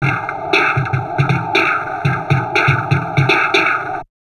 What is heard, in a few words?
DIST, Drum Loops Crazy Processed